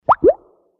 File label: Bubble Pop 2 note Plop; bubbles